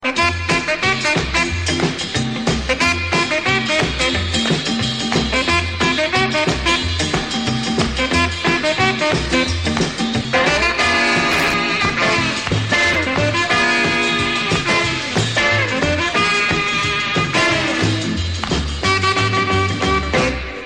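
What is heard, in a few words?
ringtone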